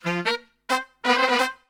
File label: SOUL BB-C, Brass, SOUL LICK; Best Service; Brass Super Section